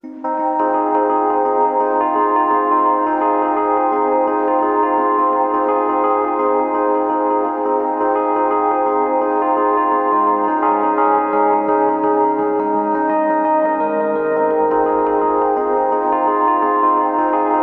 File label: Ambient